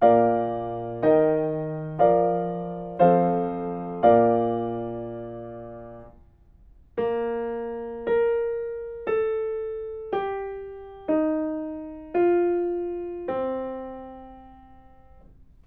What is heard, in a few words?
melodic fragments; ear training